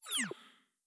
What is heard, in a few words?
Turn Down; blue archive